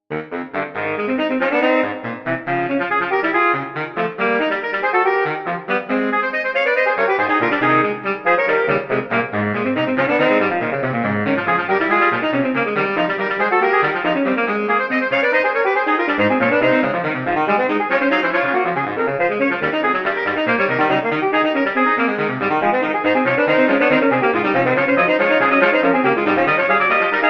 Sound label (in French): gammes pour quartet saxophones